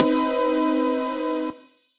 Mono, Synth Lead; Mono Collide; Ableton; Factory Packs; Punch and Tilt

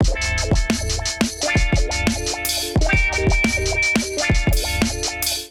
Kit groove 87 bpm, Drums; MIDI Clips; Peace; Ableton; Chop and Swing

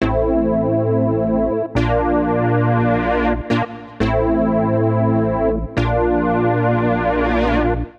Retro Keys (Am) 120bpm; Music Loops; Ridgeline Retro Keys; Ableton; Factory Packs; Singularities

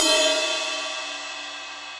Ride Bell Low Loud Squashed; Drum Hits; Standard Hits; Ableton; Factory Packs; Session Drums Club

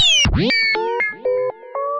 Falling Bell, Synth Rhythmic; Ableton; Factory Packs; Synth Essentials

